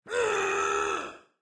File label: Creature Sounds Ranger; raycaster